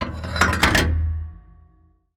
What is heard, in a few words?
gun reload; World of Tanks